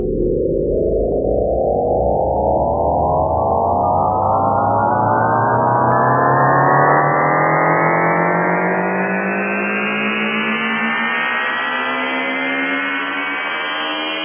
Ufo Start Quick

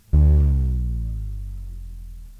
Bass Slide

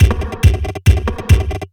Tech loop

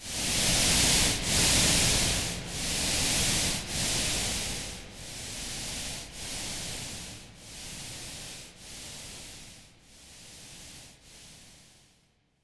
Fast Breath

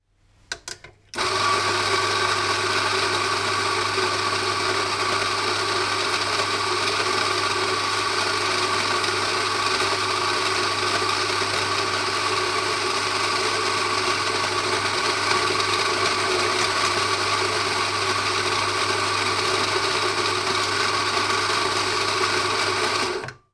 Coffee grinder

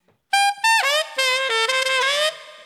4 Beat Dm 90

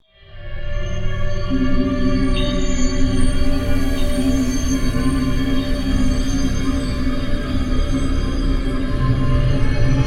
Horror Ambient W Delay Chorus Verb

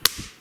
Slap

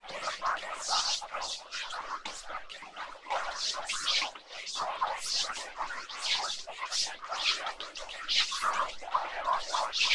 Weird Whispering Spells Dry

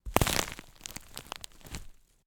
Old Rotten Wood Breaks Close Up

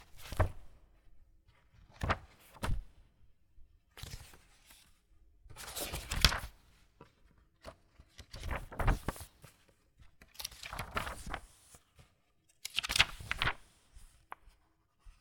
Page Flipping

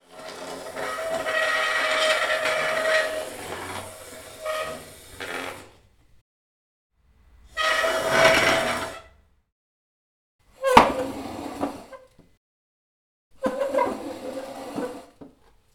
Chair Scrapes

